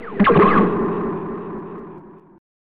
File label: Shots a Laser; simpsons the bart s nightmare; snes; Bartzilla